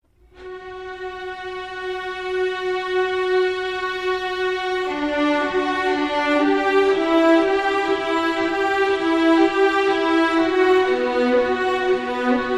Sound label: Christmas Tango Elegy